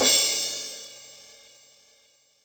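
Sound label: Crash AC, Yamaha DTXpress Cymbal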